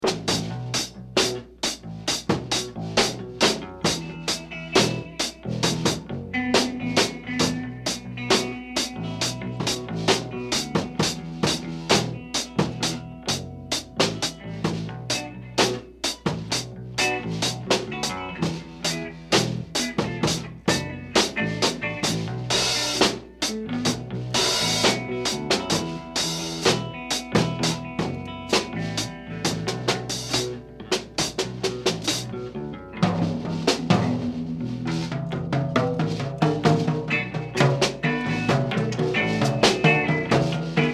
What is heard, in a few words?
Insects Jam; transitions